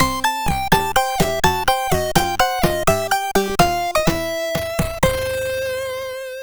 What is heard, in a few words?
sid, CSG8580R5; Total Refill